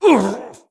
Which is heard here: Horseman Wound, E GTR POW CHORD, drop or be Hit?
Horseman Wound